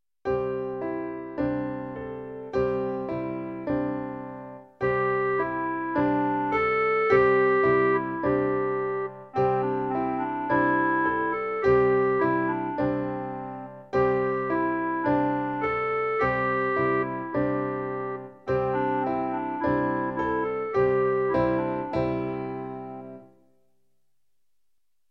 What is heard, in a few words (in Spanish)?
Canon melodico CLARINETE